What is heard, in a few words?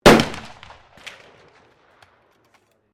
RIFLE 7.62 HUNTING FIRING